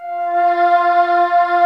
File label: PAD D50; Pads